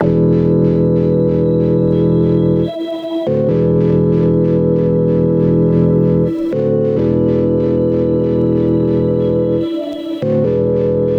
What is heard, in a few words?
Bpm138 Hard Knock Pad Loop E, Dubstep